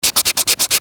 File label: Eraser Erase